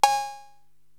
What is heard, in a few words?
Cowbell, Korg TR-Rack